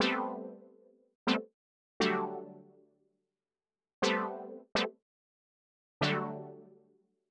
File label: 120 Stabs A, Keys, Nu Jazz House; PowerFX